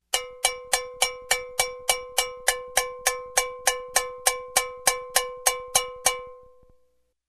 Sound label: Rhythmic Metallic Bell Hit